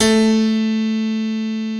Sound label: AC PIANO; PIANOS+KEYS; Pro-Rec - Synthsonic